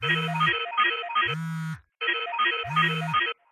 Cellphone Ring

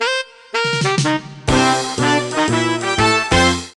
Jazzy; Worms World Party

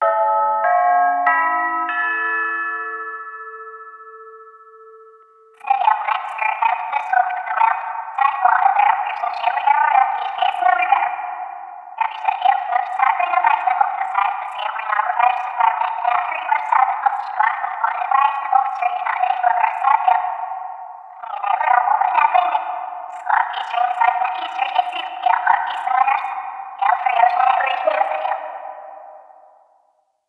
Map SFX - Airport PA 2; Splatoon 3

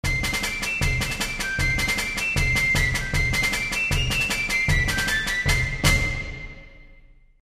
military parade

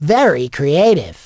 correct; lego my style kindergarden

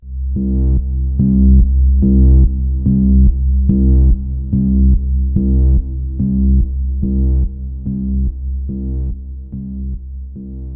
Stinger Exploration Drone Pulse

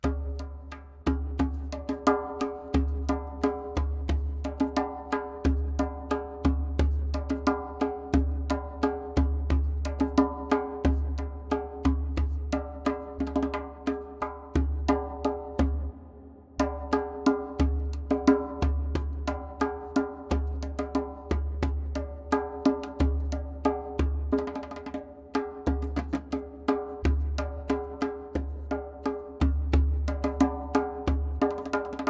Drums; The Queen's High Seas

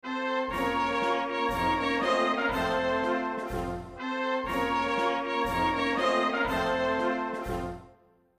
lose jingle; France

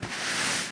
Sfx Pet

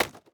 plastic hit

